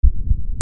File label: PEOPLE